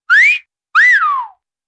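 sounds of cartoon whistle at the sight of a beauty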